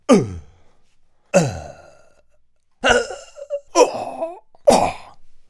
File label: Male Fight Yells